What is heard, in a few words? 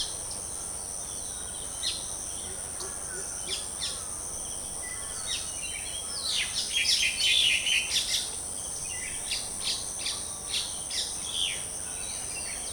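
countryside ambience birds chirp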